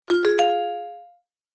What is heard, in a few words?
new notification; m5stack; StackChan